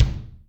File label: BD ROOM, Yamaha DTXpress Acoustic Kick